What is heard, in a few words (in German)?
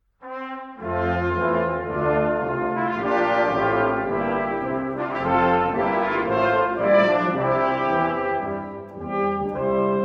marsch